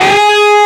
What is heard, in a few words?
bend full; osu